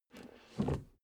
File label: suv push forward gear straight soft slow; verkehr transport; autos